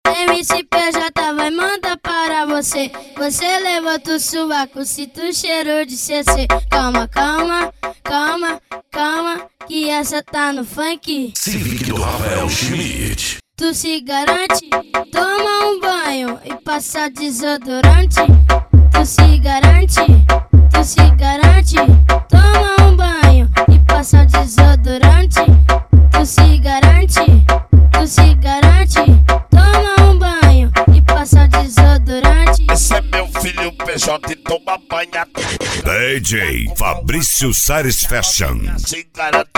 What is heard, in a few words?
funk mega bass